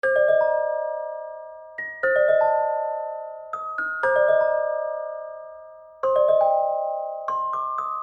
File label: Short Gothic